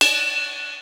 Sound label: Yamaha RX-5, drums perc; Rubber Chicken Software Sample Bank